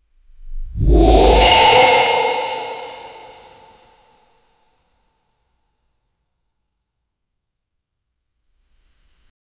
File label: dragon roar